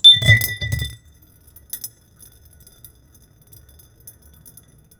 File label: tired in kitchen, human actions